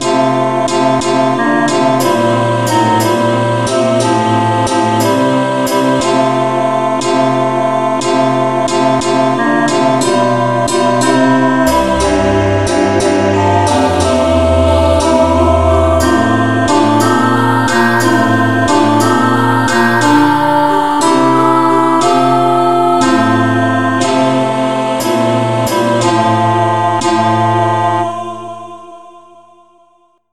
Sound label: no vocal key f; Angel voices, ever singing